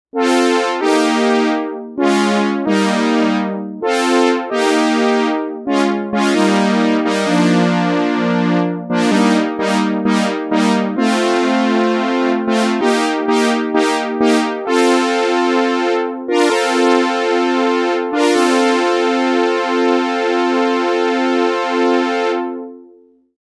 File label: Hi Brass